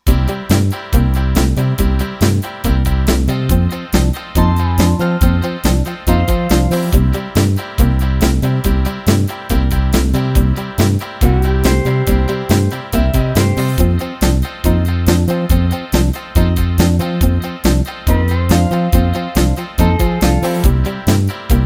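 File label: Country Boogie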